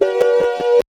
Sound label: sinewave drums